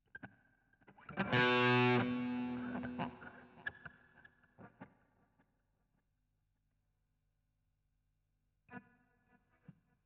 GTR A Solo

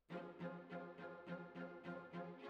Cellos Over

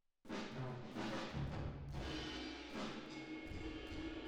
Drums Verb

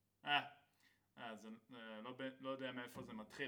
Ride

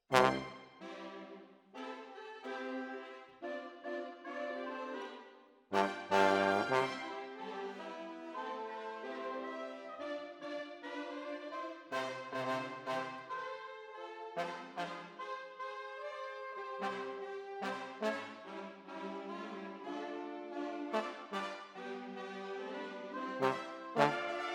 Tromb ASS

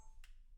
Children Choir; Studio Bekol Children Choir

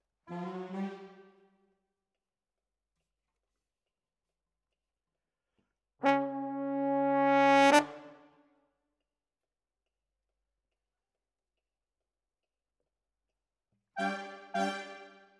Horns

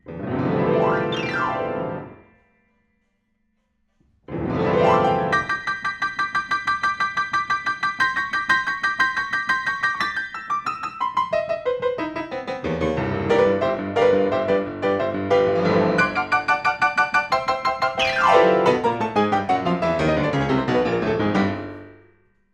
PIANO, RNR for piano

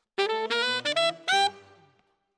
Alto, RNR for piano

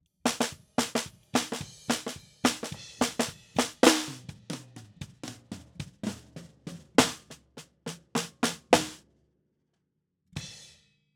SNR BTM

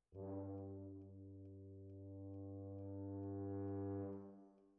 Sax

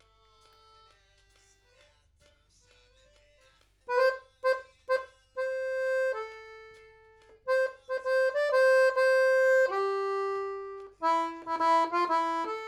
Accordeon

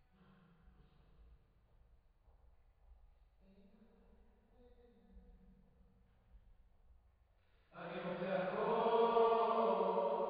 Choir

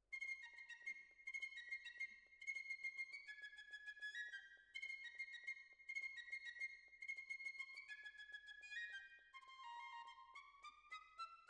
Clarinet

